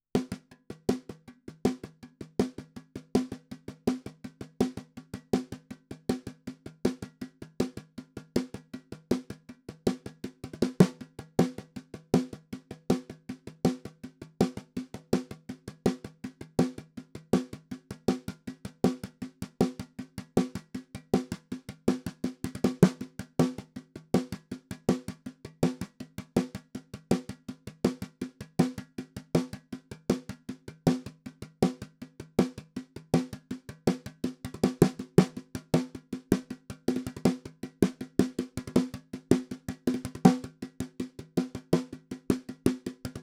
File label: perc intro snare